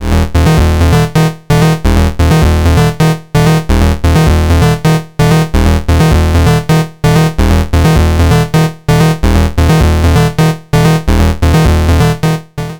Multi Function Discrete VCO Sync Gate; ACL

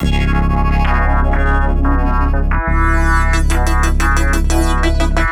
Riff; SPD SX

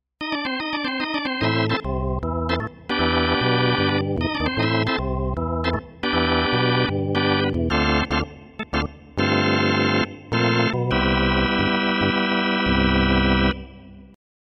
Jazz Organ

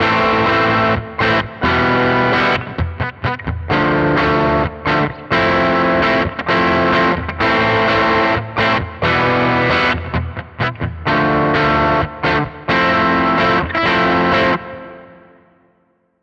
Nux MG-30 Super Reverb Morning Glory

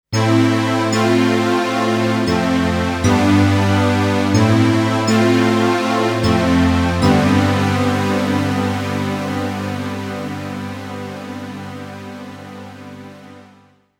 Equinox Pad

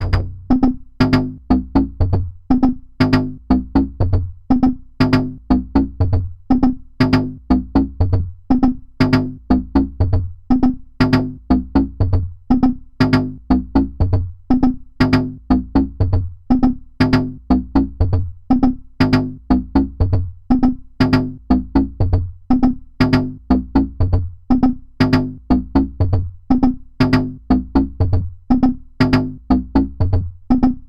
Dreadbox Drips V2

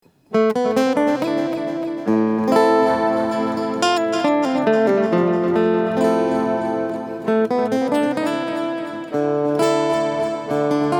ME 3 Big Ben Effekt; LAVA MUSIC

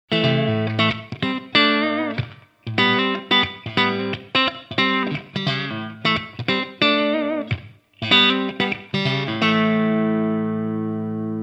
Neck PU Clean

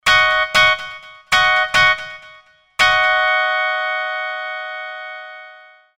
bright e pno

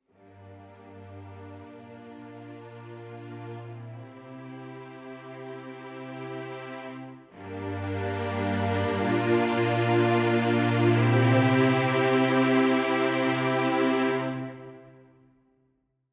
Full Ens Sustained; Audio Imperia Areia; Classic Mix

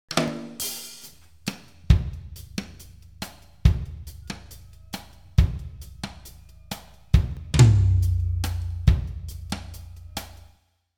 Plate Drums

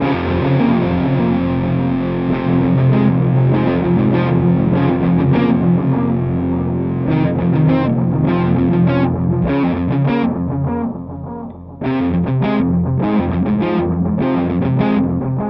Symmetry Delay Fuzz; Black Hole

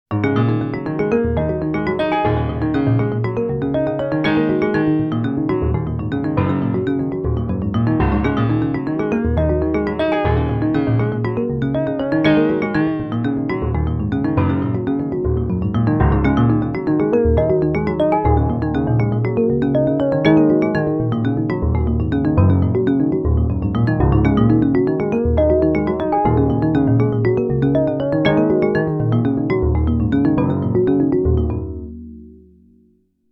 Sequential Prophet X Mallet Piano

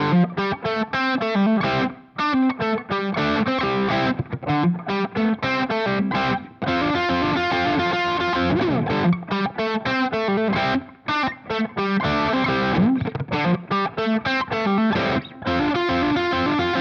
Vox Valvenergy STD

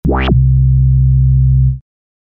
Bass

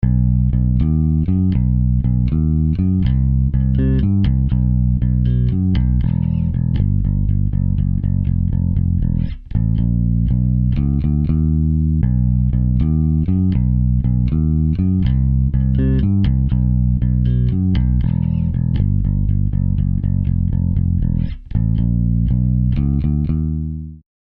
Bass Wet

